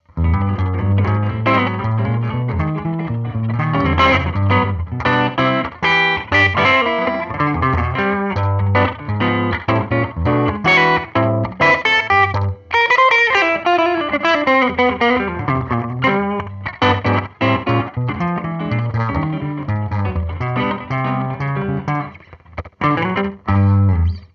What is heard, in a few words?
guitar play music; The Sims 2